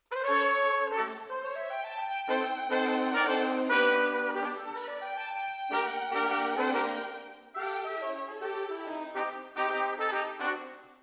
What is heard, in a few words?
Horns Over